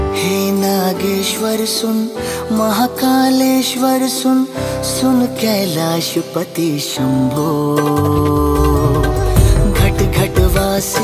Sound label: Bhajan Song Ringtone